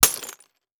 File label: Impact Ceramic Debris Hit By Copper Pipe; Impacts